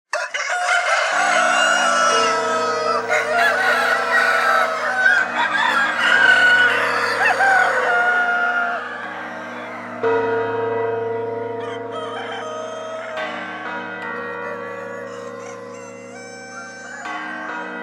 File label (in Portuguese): Galos e Sinos